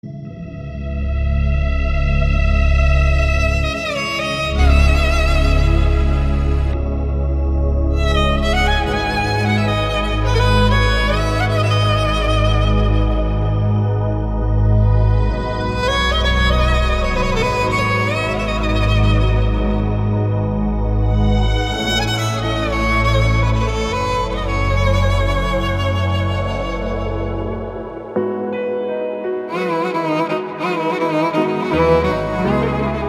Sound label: Ring